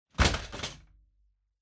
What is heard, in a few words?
Vacuum Cleaner Knock Over